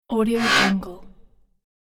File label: robot core user sound effect